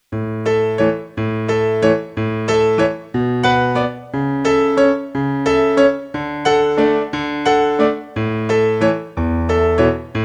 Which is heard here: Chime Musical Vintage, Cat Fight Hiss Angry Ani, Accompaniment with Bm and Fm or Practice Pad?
Accompaniment with Bm and Fm